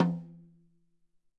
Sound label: Tom, EMU PX-7 Drum Machines